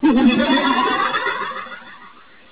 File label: thief